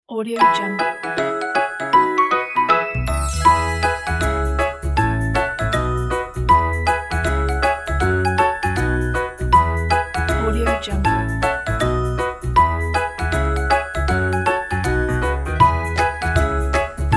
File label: cute funny kids